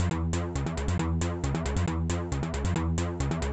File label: techno mistery sequence synth; synth loops